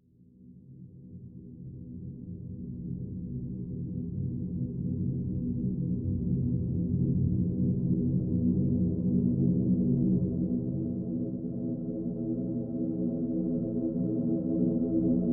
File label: Atmos Fantasy Drone Moving; Sound Design; Sci-Fi; BigRoomSound